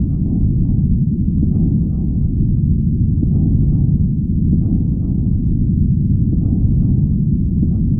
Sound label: Drone Ambient; Drums & Drones